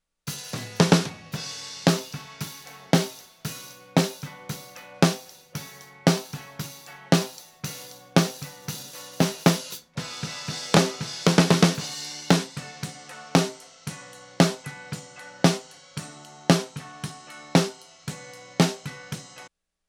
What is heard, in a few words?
snare even 6 odd 7